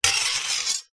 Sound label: warfare sword medieval heavy draw scabbard